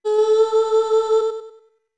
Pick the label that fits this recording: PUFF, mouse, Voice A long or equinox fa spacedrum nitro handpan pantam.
Voice A long